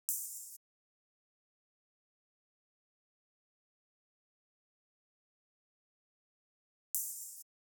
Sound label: Drum Loop, 70 BPM Open Hat; Cymatics; Vibes II Drum Loop Collection; Dialed Drum Loop